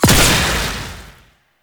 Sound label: Pistol Projectile Embed; Weapons; Warframe; Tenno; Temple Signature